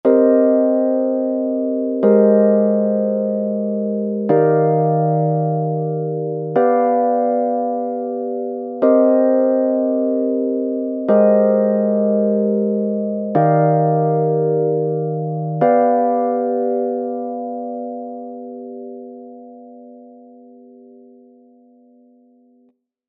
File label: pre-chorus